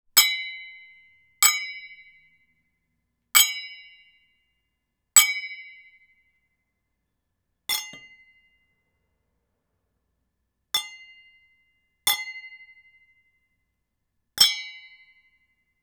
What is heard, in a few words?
Wine Glass Empty Clink Together; Foley; Props; Foley Essentials